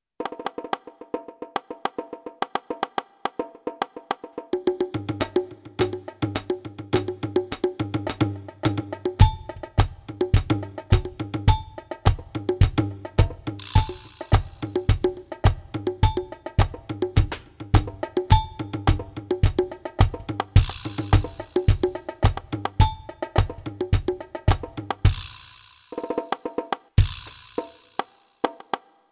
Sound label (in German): Mesh Kit Percussionsounds; ALESIS Nitro Mesh Kit